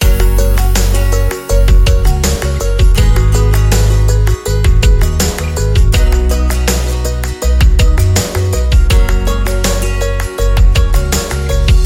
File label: Modern Fresh Acoustic Loop; MIUI14; HyperOS